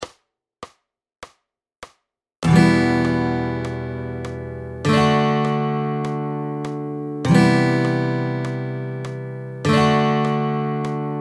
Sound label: Fingerstyle Fun Getting Started The Chords